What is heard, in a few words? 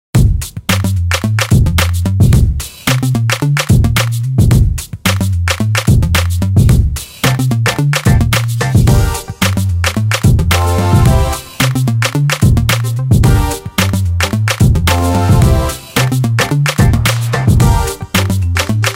Nokia Tones; Flattered; Windows Phone apps